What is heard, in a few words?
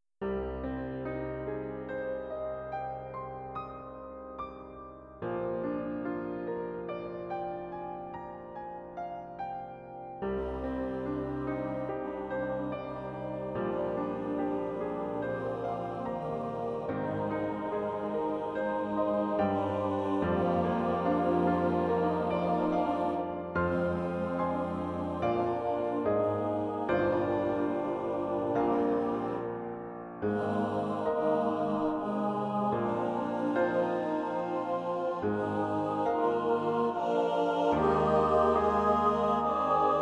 Wedding cantata A Faery song; choral